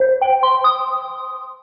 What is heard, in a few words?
new pm